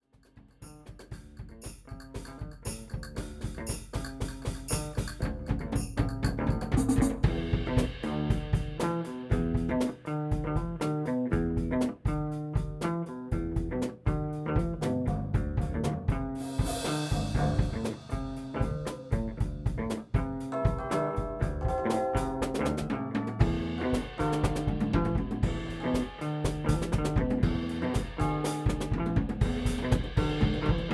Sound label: Marimba